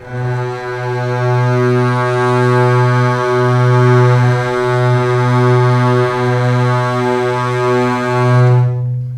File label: B2 LEG F, String; Ultimate String Collection